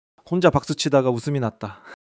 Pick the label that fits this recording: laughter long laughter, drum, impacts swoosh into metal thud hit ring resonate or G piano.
laughter long laughter